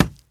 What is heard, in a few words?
Fruit Collision; angry birds rio